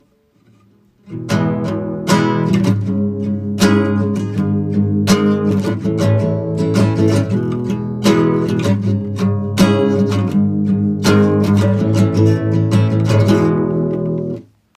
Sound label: guitar cover